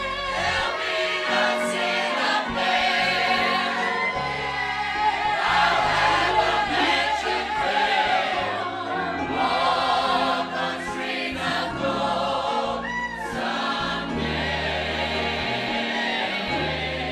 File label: choir